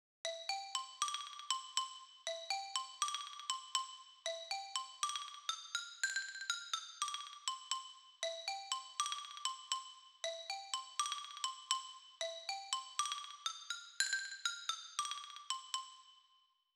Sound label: Xylophone; VSL